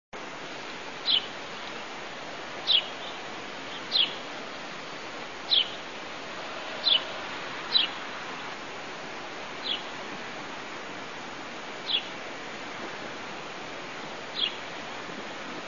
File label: sparrow house; birdsongs